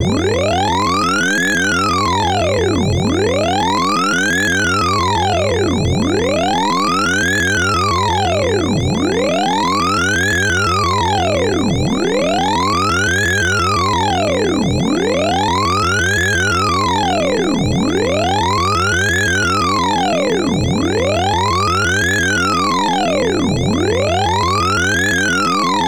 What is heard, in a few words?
Power Ball Appearing; Bomb Jack; PC-8801